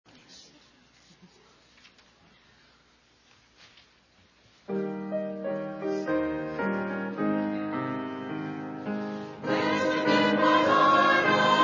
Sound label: choir